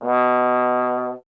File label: Trombone B; Miroslav Vitous - Solo Instruments VOL-1